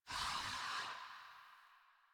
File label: Generic Footstep; Warframe; Choir; Foley